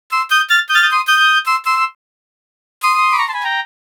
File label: Flutes & Swingers Flutes C; Zero-G Kocktail Kollection; Construction Kitz (Lounge)